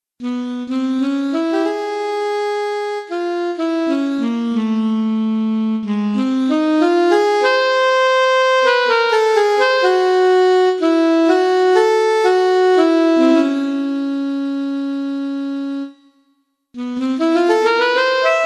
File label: sax solo